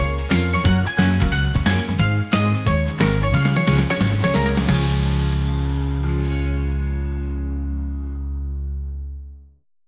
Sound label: Logo Cartoon